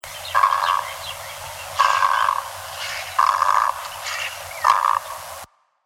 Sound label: Great Egret